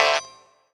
TING; Rawcutz; Nu Forms

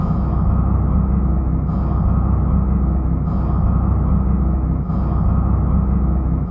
ATMOSFX; Best Service; Advanced Mega Effects

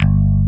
PICK BS, JV 1080; Pro-Rec - Synthsonic

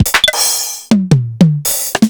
Akai MPC500; Drumul8r

